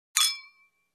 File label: Drinking Glass Clink